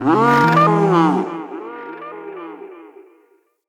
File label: Ext Dist Animal; Midrath Island